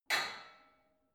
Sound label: barbell only lift up reverberant; sport freizeit